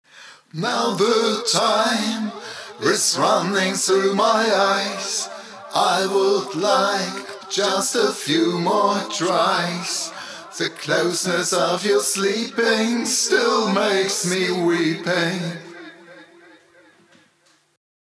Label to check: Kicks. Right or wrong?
Wrong